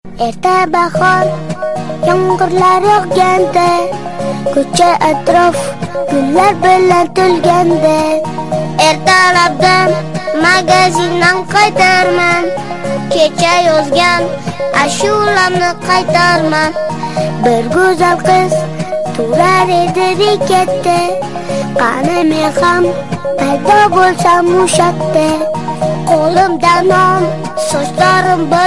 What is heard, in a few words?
spanish boy ringtone